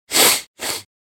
SHmygajut nosom

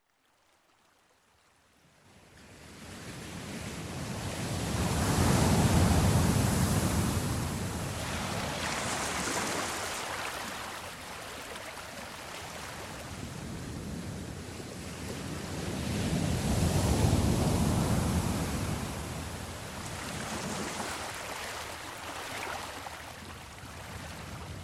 Volny

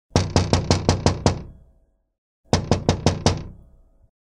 Postuchali v okno

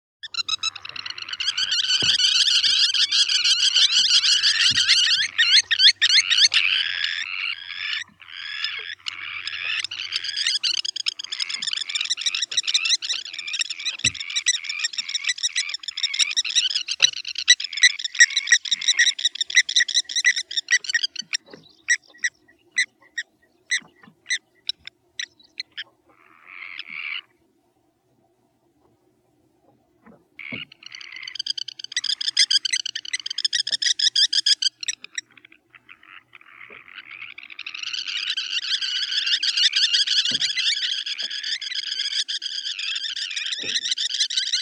Zuek

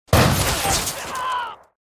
Ranili

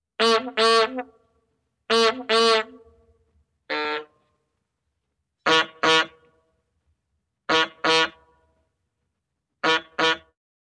Vozdushnyi signal rozhok

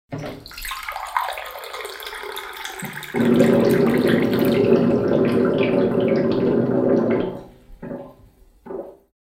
Kuler ofisnyi

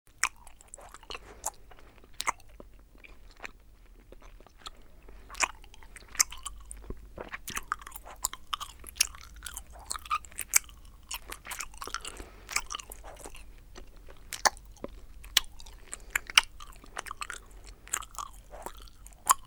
ZHuet zhvachku chavkaet

